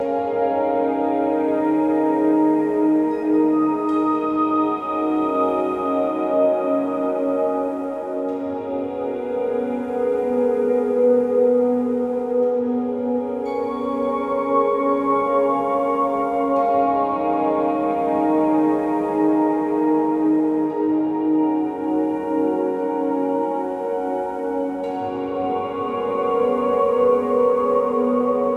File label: BGM Lobby